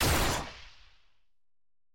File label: paah; pyke